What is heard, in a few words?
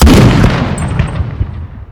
bfg sniper, weapons; tf2classic